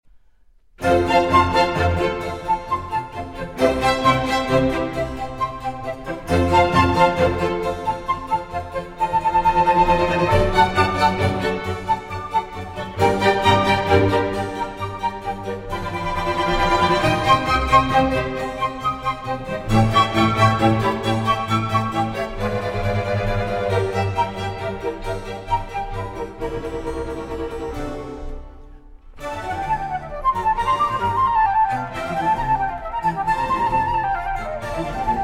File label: Flute Concerto in G Minor